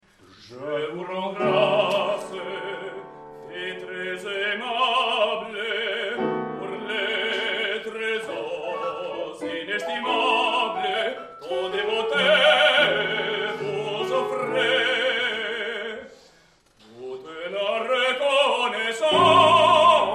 Air du roi baryton

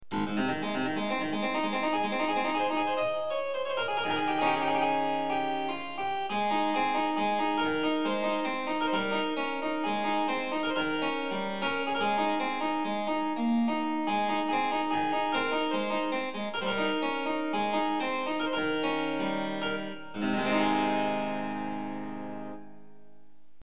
clavecin

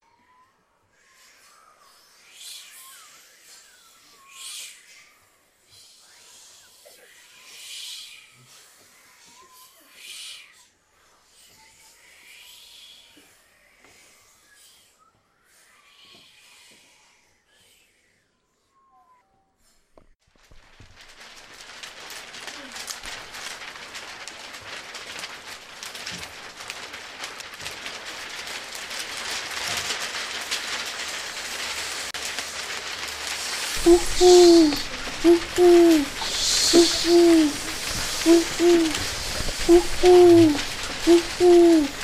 foret

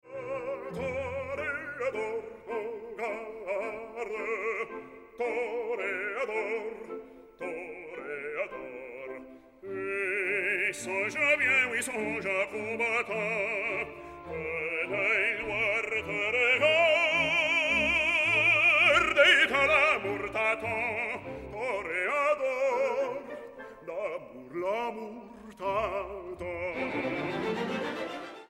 toreador voix; CARMEN

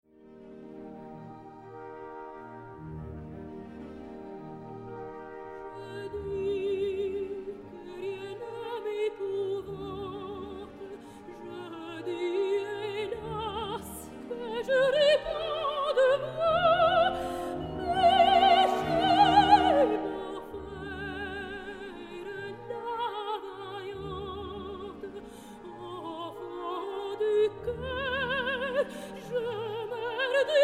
rien ne mepouvante voix; CARMEN

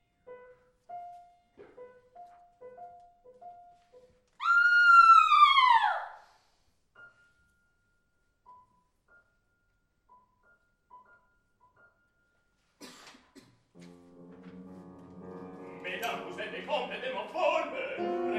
anastasie cri talon

